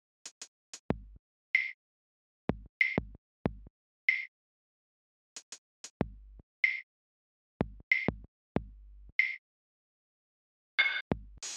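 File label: lowkey loop 94bpm C Major; colorlab; chicory a colorful tale; ps4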